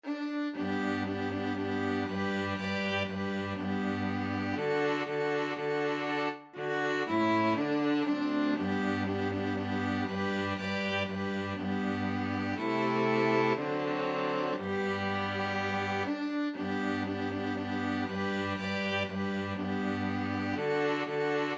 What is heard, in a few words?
string quartet